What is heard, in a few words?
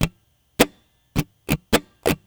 Folk Pattern, guitar